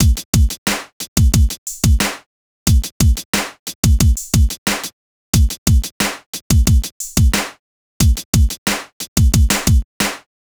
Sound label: Drums, 090 Cmin; Hip Hop Exotica; Apple Loops; Big Fish Audio